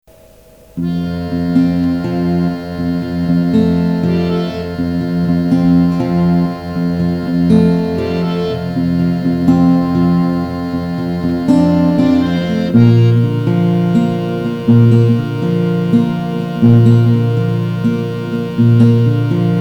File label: Instrumental